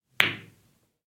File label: ball hits, Billiards